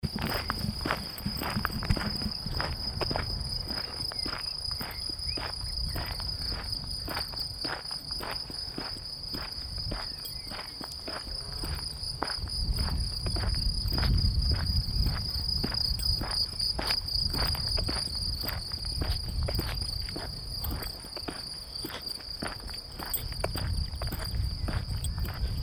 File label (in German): Zirpen Laufen Kiesweg